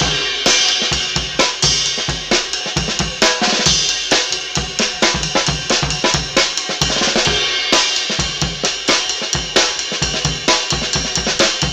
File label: enlongated solo, Funky Drum loops